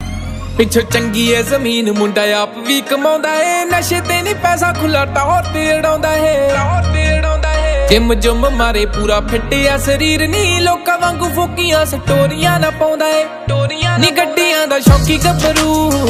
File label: Jatt Punjabi Ringtone